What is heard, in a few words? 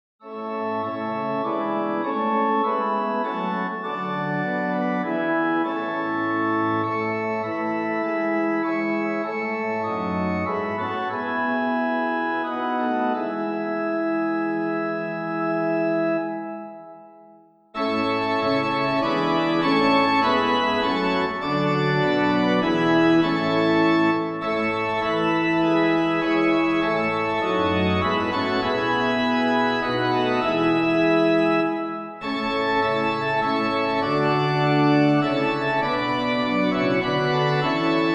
Organ